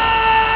car horn

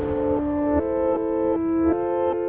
female laugh

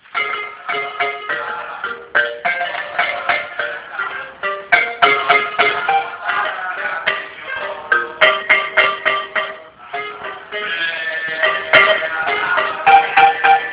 marimba

